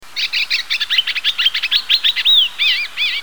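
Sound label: chestnut-cheeked starling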